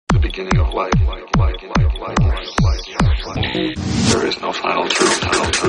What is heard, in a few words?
alien pets